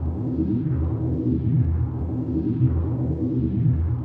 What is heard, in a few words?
wormhole incoming; ambient; Stellaris